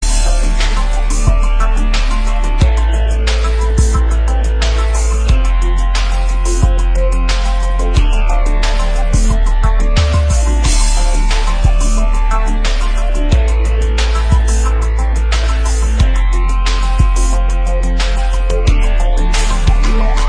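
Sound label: heavy metal bass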